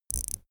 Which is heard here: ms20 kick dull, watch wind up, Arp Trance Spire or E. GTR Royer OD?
watch wind up